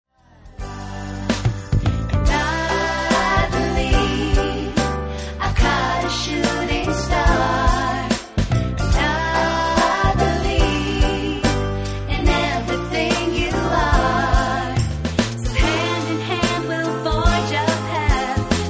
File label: Contemporary Folk, Vocal Samples; Commercial